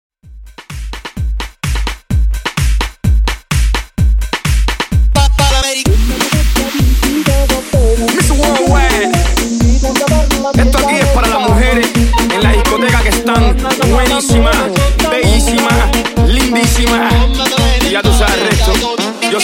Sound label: Latin Afrobeat, 128 Bpm